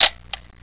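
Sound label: Rollei 35 RF, camera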